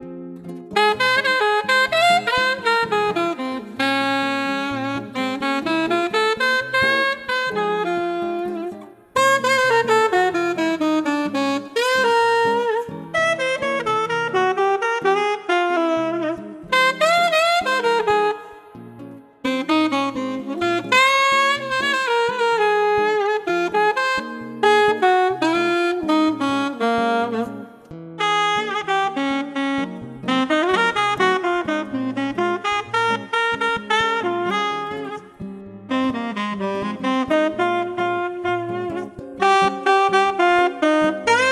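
Klassicheskij dzhaz, Zvuki Kofejni, classical jazz, coffee shop sounds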